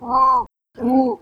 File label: penguin